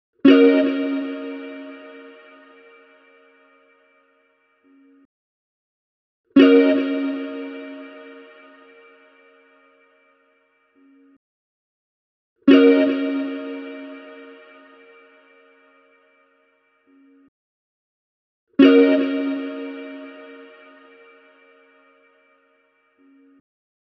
157 BPM D# Min Guitar Chord Strum; Melody; Loop Stems; Cymatics; 2022 Melody Collection - Various; Bellagio

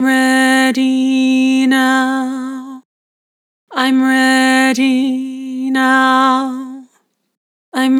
Vibrato, Vocals; Harmor Vocals

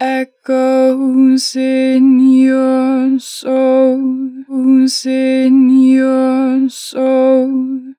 Echoes - 4 bar vibrato, Vocals, Natural Vibrato; Harmor Vocals

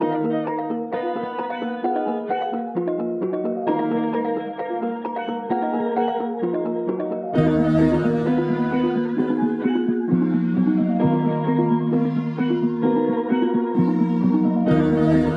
131 BPM D# Min, Melody; Cymatics; 2022 Melody Collection Platinum Expansion

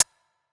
Hihat; Ghosthack Advent Calendar Day 4

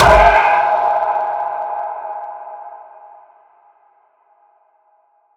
misc oneshot, FX; GhostHack

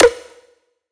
Trap Snare A#; Drums; One Shots; Snares; Cymatics; EDM Starter Pack

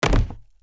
BF Head To Floor Comp; Fairlight Sound Lib

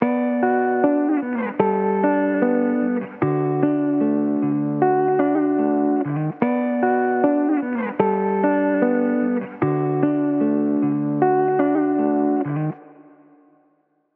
Electric Guitar Wet - 75 BPM B Min; Melodics; Cymatics; Eternity Sample Pack; Guitars - Electric

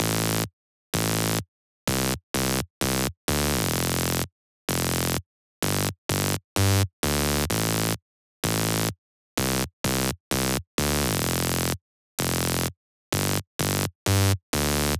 128 BPM A Min Bass; Drop Loops; House; Cymatics; Paradise

